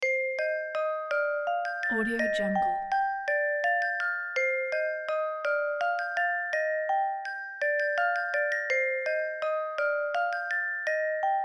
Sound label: medieval musicbox